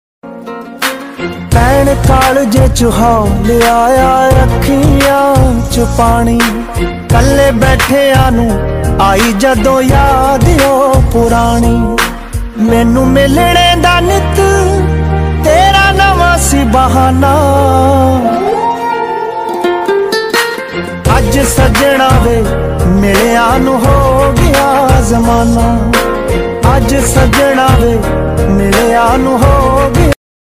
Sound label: new ringtone sad punjabi song ringtone new punjabi ringtone heart touching ringtone